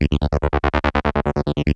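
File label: atmosphere; Zero G; Man Machine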